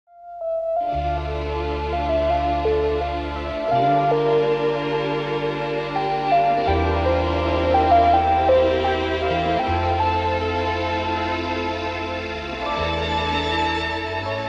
vinyl

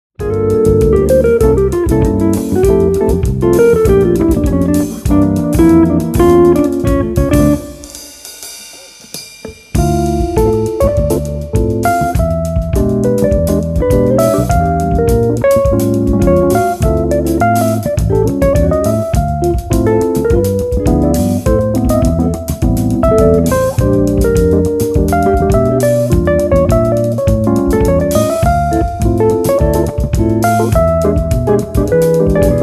Jazz

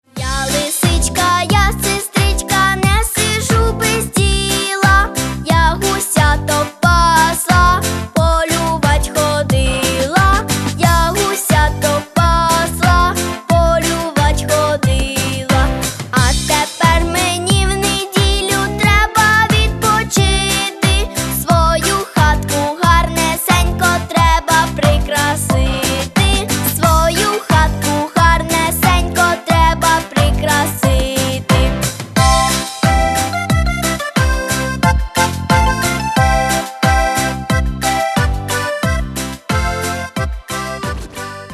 Karaoke; ukrainian folk songs for children in modern arrangements karaoke

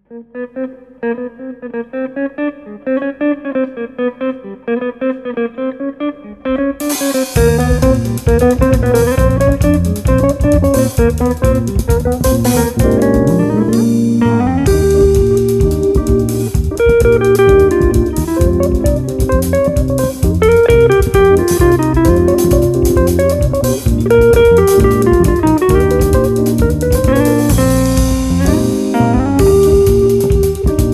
Jazz

